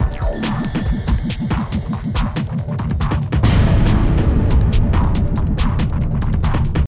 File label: Aether Transition 2 Med; Unreal II The Awakening; Acheron